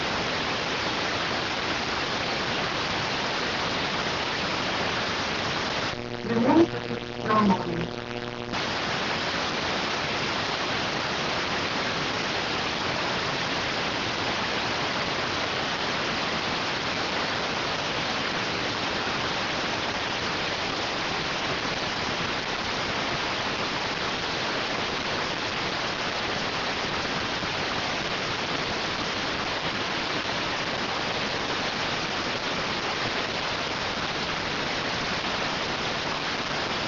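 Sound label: radioteletype receive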